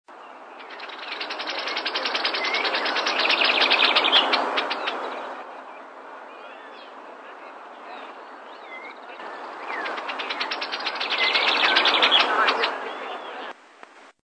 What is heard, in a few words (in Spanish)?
voz tordo renegrido